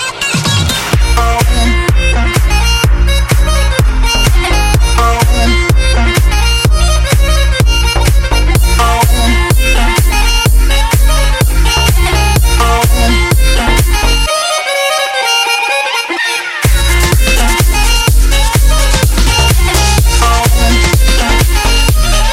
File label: dance song